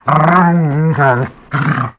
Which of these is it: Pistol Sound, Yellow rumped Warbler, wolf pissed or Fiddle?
wolf pissed